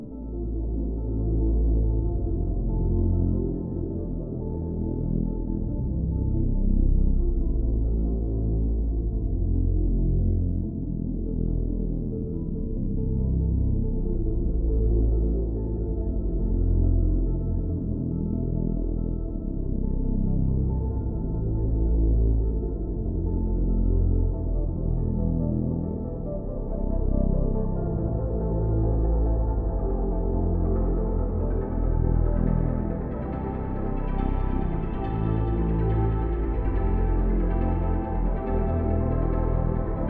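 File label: Music Standard; raw game audio files; MiSide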